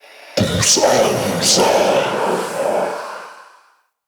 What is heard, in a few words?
Male Vocal Charge Scream, Enemy; Mockery; Mockeries